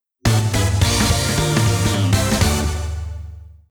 Unknown Battle Jingle

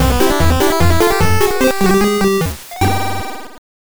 Level Clear; M&M's Minis Madness; nintendo game boy color

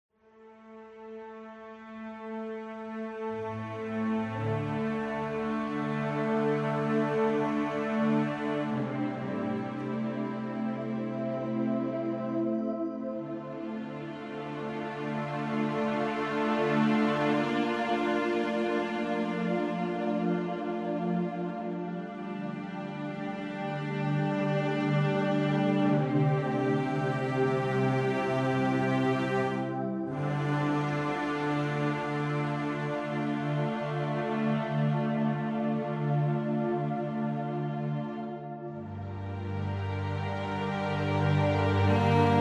Loop Emotional

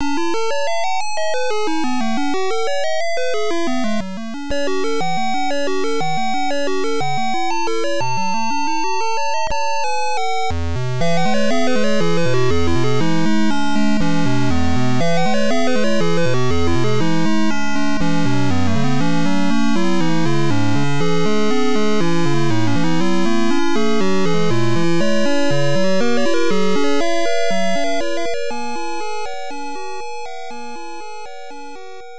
Flower Power-up; Akazukin; arcade

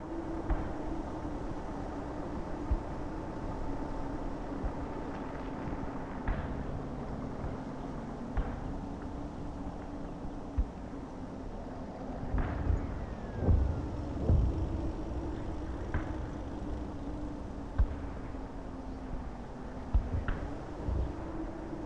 Ambiance Mogadishu

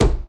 Stamp; Peace, Death

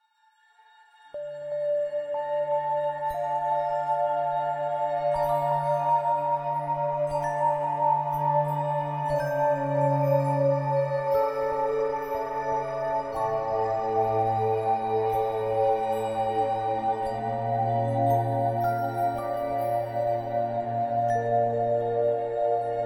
build phase; DLC FOREST AUDIO; MISTY MIRE

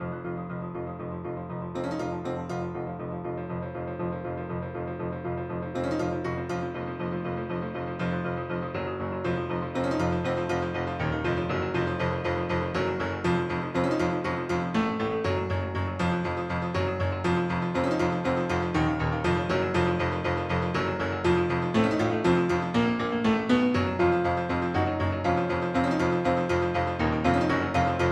Mines Splendid Piano; Xandralite; Blackthorne; Blackhawk; DOS

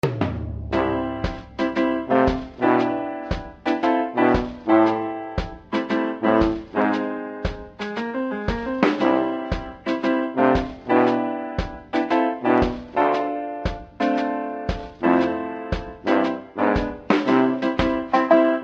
Skins Music; Pixel Gun 3D

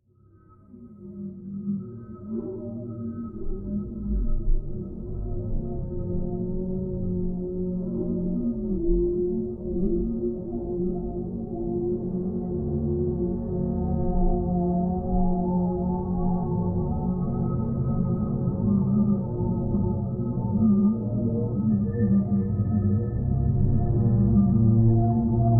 Dronings; No More Room In Hell; Windows

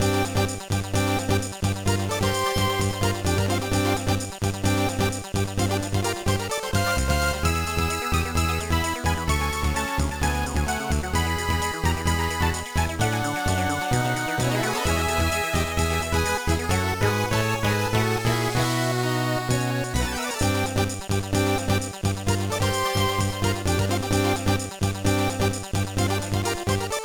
BGM; Croket 2 Yami no Bank to Banqueen; gba

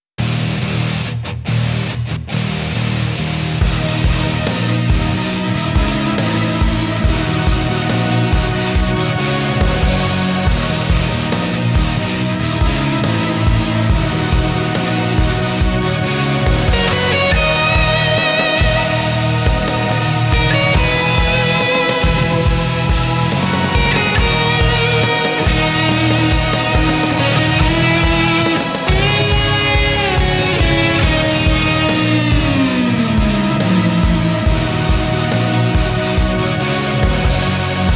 Threat Beat (Story BGM); King of Fighters XIII; PS3 version

